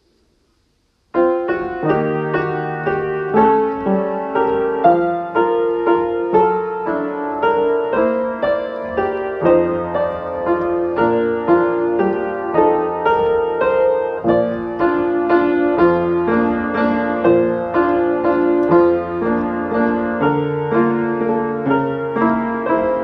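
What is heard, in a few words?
accompaniment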